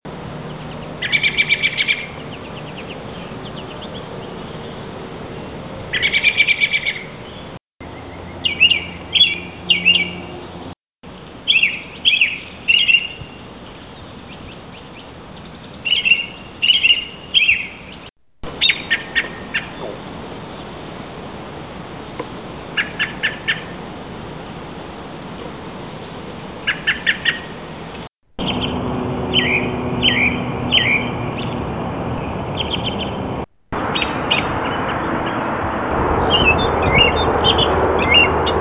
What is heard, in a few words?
american robin; Birds; Thrushes Robins